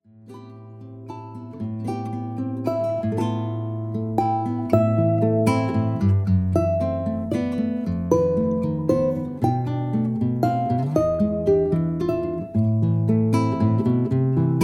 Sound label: buleria